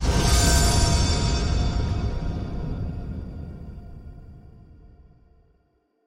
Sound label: magical hit with sheen; soundscrate